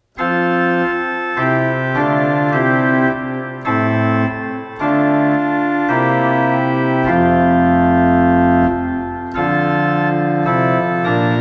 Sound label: prelude; O Salutaris Hostia; Alexandre Guilmant